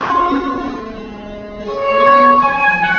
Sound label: soundstream music evs 5.9kbps truncated